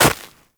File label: Run Snow, Footsteps; Warframe; Characters; Operator